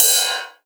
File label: Ride, Cymbals; Vengeance Samples; VEH2